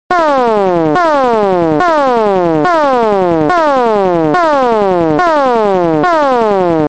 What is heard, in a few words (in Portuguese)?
alerta de nave